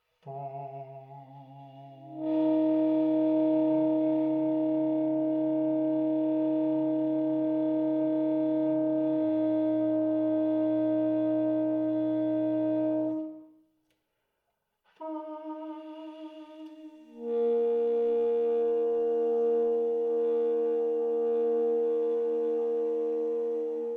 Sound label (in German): sfx ten, saxophon spieltechniken; Gesungener, dazu gespielter Ton; kurzes Beispiel zur »Zweistimmigkeit«.; Tenor